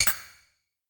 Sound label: social offline; tetrio